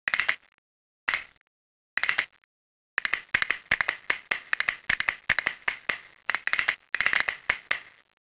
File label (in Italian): nacchere; Tono di notifica; per Cellulari Android o Iphone